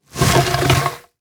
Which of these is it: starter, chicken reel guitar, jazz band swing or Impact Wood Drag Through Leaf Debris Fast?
Impact Wood Drag Through Leaf Debris Fast